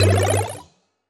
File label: collide checkPoint; ball rolling game